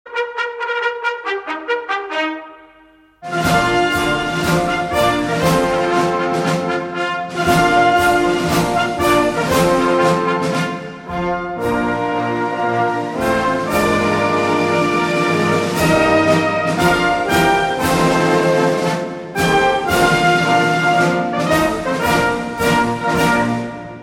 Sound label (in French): trompette de cavalerie suivi du refrain; a letendard